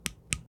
flashlight; midnight guns

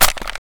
mp5 out; midnight guns

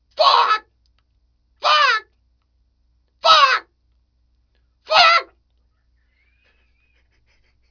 chicken; midnight guns

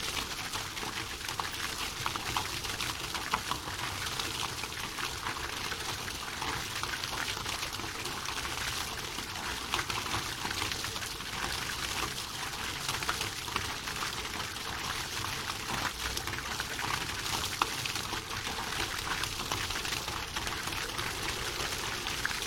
amb watersplash; midnight guns

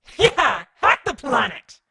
round win; midnight guns; bootsector

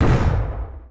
ssg fire dist; midnight guns

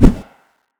throw; midnight guns

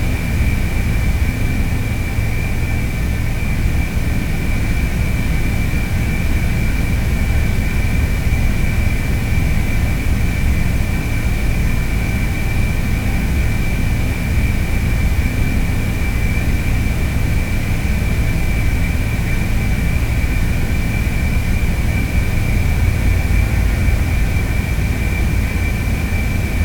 industrial loop; midnight guns

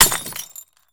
glass step; midnight guns

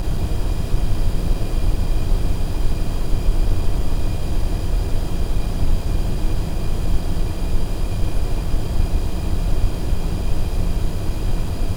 industrial steamy loop; midnight guns

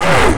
pain, player; midnight guns

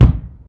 foot wood, footsteps; midnight guns

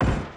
mp5 fire dist; midnight guns

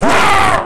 death, player; midnight guns

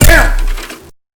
bot spit; midnight guns

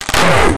fall crater, player; midnight guns

